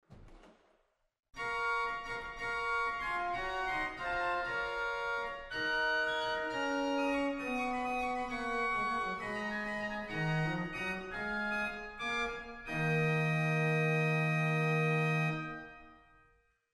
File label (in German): Geigenprincipal 4, organ; waltershausen